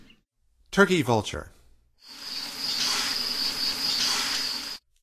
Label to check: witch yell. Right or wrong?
Wrong